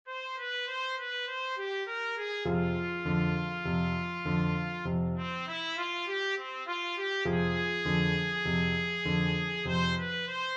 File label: boogie trumpet; elise